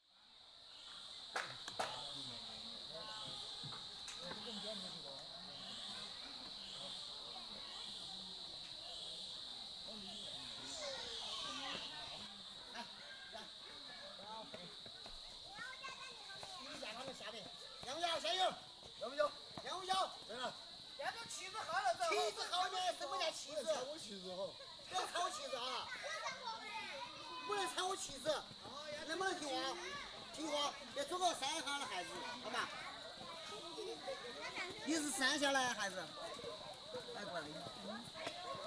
Kids in the wild, China; Les Cartes Postales Sonores; NOISE ON EARTH Vol6

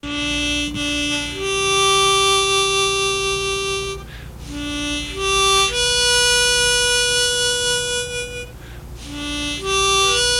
Harmonica Funeral

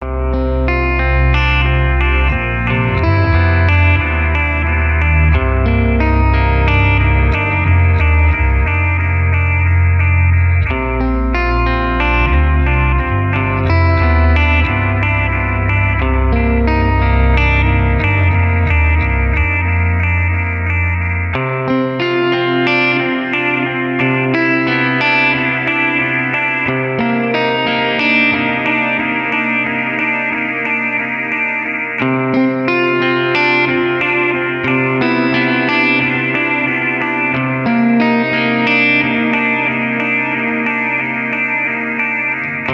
Emotive Jangly delayed guitar with bass; CUES for film TV games etc; SAD

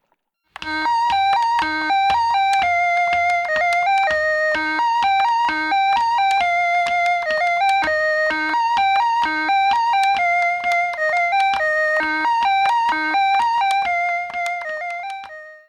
Blippity Bloop

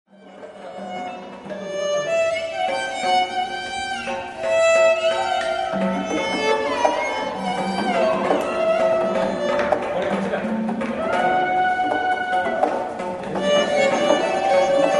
sirangi improv, Field Recordings from India; WFMU

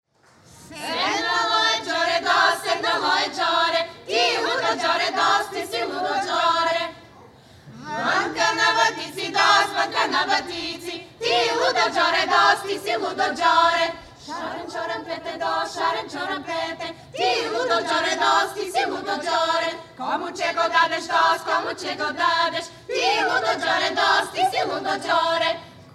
Bulgarian Womens Choir; Djore Dos; Live at the 2014 Golden Festival; WFMU

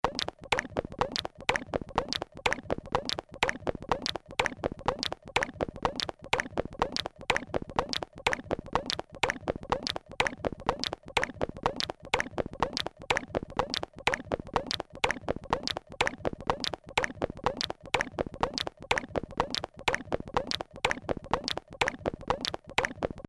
bubble percu

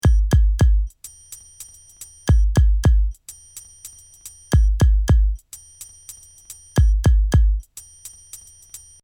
triangle wood and kick, 107; Loop Mania Sampler Pack

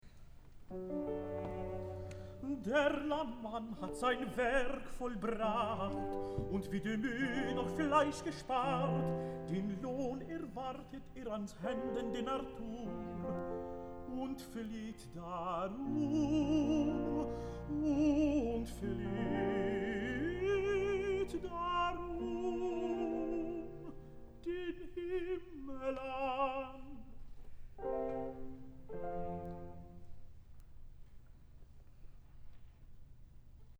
Recit; Haydn; The Seasons Die Jahreszeiten; Der Fruling; Der Landmann Hat Sein Werk Vollbracht

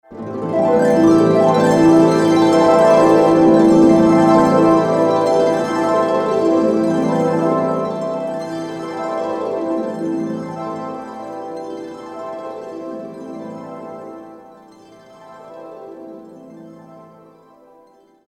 Harp Heaven, Jingles; Jingles for films podcasts

